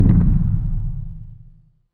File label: destroy destroyer; fertig-classic-games; Tents; vega